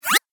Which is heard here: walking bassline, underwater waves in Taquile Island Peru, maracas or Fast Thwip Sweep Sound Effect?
Fast Thwip Sweep Sound Effect